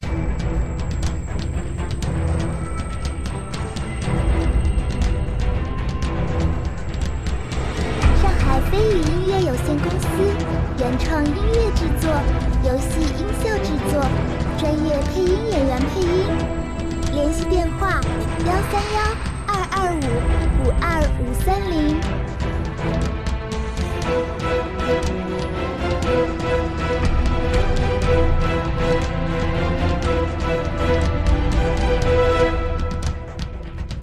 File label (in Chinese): 危险紧张 boss; 射击类